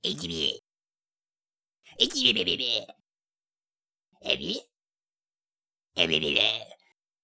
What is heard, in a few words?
minion fight; spiritheroes